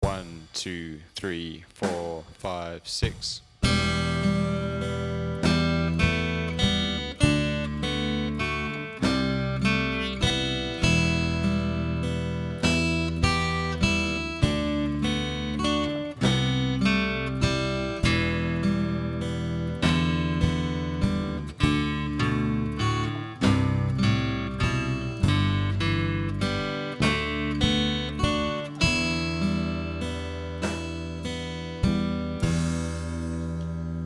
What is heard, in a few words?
solo